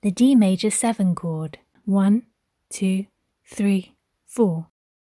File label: d maj7 chord